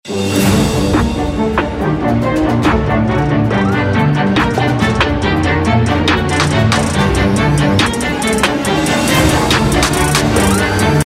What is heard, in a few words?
RINGTONE; FORMULA1